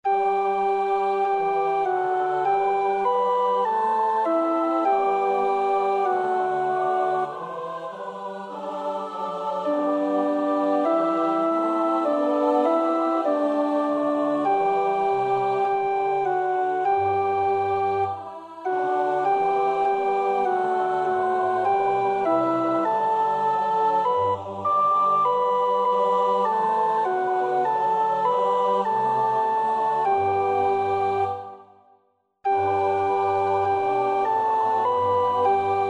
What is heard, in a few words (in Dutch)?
psalm 98 (alt); Vesper 34; Oefenbestanden